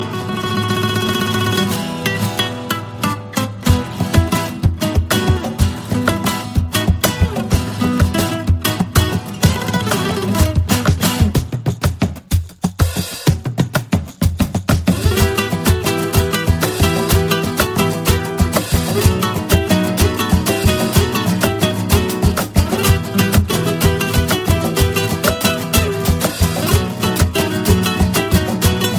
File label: awesome spanish guitar; Latin